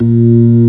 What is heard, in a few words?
ORG B3 A#2; Best Service Gigapack 2